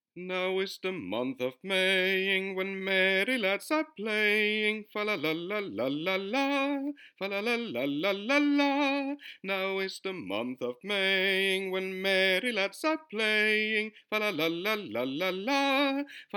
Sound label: Maying tenor